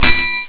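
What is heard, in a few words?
message bell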